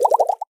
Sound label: Bubbles; China Telecom